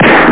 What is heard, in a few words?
SINGLE SHOT; Wargame Construction Set v1.0; SSI